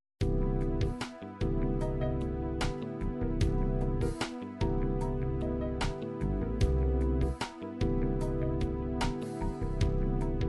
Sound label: funk fusion, midi